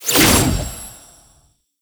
Summon Rune Pick Up; Warframe; Uriel; Vythelas

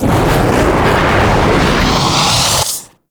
Teleport Build Up; Enemies; Abilities; Warframe; Zariman; Void Angel

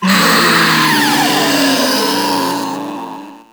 Proto Infested Ancient Death, Enemies Vocals; Warframe

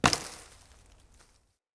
Acorn Impact; Cinematics; Bromius; Bromius Tree Rub; Bromius Quest

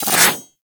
Melee Whoosh; Warframe; Corpus; Arachnoid Hunger; Abilities

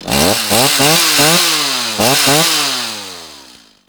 Sawman Rev Ambient Attack; Enemies; Attacks; Warframe; Grineer Ghouls

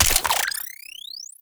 Reload Scope Gun, Weapons; Warframe; Corpus

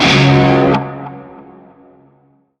Shawzin Chromatic Chord; Warframe; Narmer Shawzin

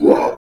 Infested Melee Grunt; Warframe; Characters

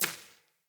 Sparrow Step Default, Footsteps; Animals

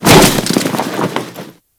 Grineer Body Roll; Warframe

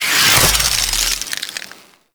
Glaive Hover Start; Warframe; Tenno; Teshin

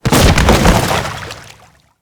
Fall Water, Body Falls; Grineer

